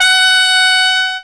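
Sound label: very high note, trumps; Zero-G - Funky Elements; TRUMPS+BASS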